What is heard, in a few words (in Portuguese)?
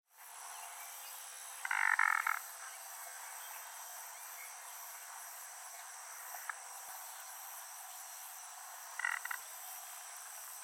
biguatinga